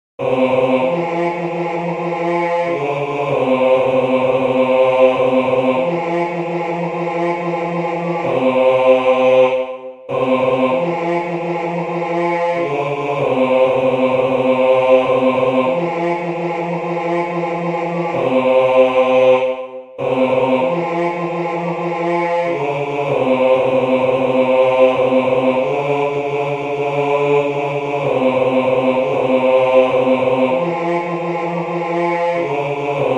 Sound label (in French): Le berger d Aure basse